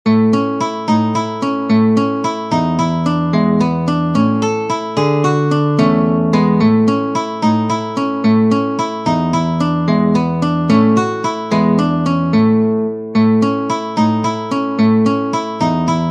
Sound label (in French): Walze, guitare; Ferdinando Carulli; Op. 241 Méthode pour la guitare